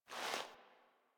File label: React Wall Pulse Operator Foley Lift Head; Warframe; The War Within